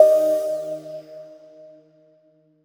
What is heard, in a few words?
Cmin - collision